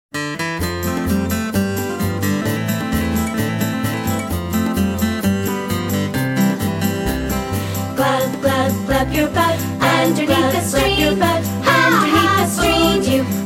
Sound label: Glub Glub Glub Your Boat